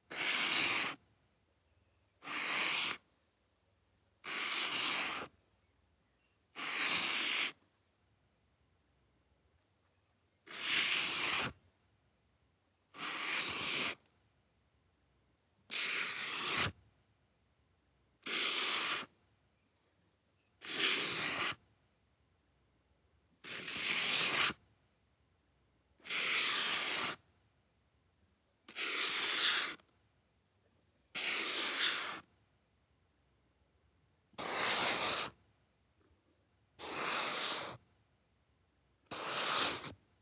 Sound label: Marker